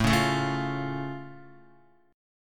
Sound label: A Augmented A down Guitar; strummed